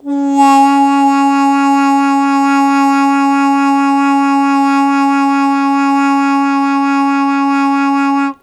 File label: Long Sustain Fast Wah Wah C#2, Harmonica; Zero G - Harmonica